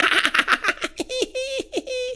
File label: laugh; worms